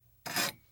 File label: Glass Scrape Cut; Fairlight Sound Lib